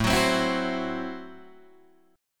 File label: A Minor 6th A Guitar DADGAD